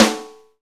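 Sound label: Snare, Roland; Real Snrs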